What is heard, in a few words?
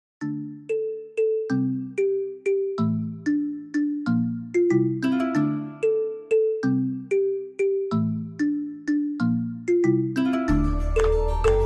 Fusion